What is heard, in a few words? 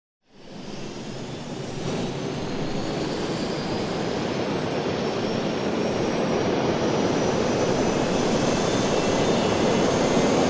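Marchetti: Takeoff Runway; Whining Engine Taxi, Speed Gradually Increases, Jets Ignite To Very Low Roar Of Takeoff, Away. Close To Distant Perspective. Jet.; av-8b harrier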